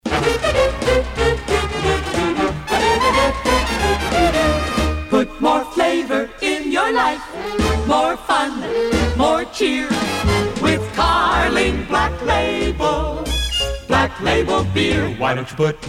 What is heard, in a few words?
Carling Jingle; Lorin Frank Productions